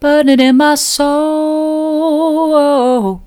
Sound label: burning in my soul soft, vocal